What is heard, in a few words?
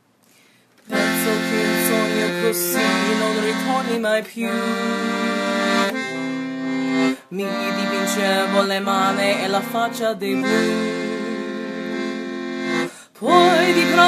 Italian Singer